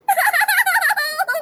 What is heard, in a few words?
cute laughter; little miss sunshine